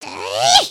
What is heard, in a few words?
slash ninja teleport; emotes